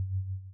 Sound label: boss attack; archive-world